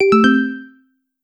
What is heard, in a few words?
Contact Logs Out